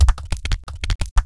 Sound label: machine rock